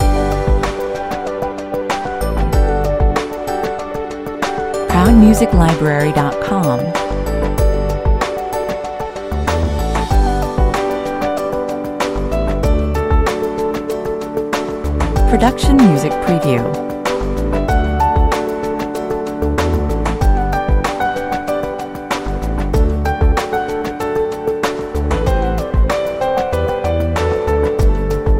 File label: friendly corporate theme loop